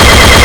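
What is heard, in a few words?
Pulse Fire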